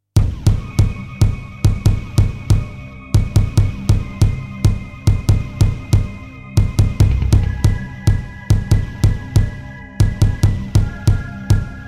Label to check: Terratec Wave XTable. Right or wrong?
Right